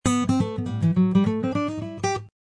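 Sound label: acoustic guitar jazzy lick A; Lounge & Reggae; Loopology; Be Bop Baby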